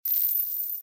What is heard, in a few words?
win